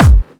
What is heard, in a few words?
Clubby Kicks, Bassdrums